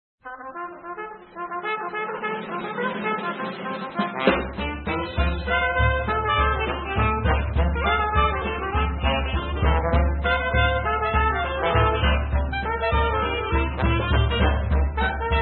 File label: Bugle Boy March